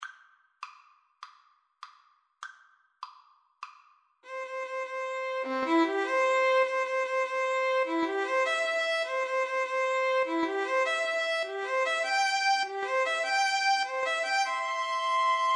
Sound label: wedding march, 2 Violins Cello; mendelssohn